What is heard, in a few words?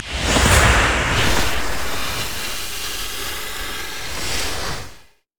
smoke grenade loop; LoL; graves